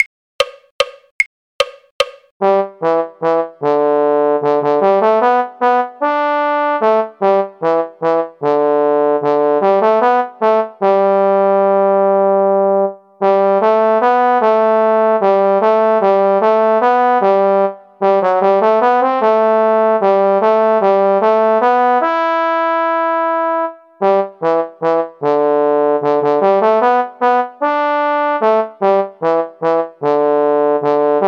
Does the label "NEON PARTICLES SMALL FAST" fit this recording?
No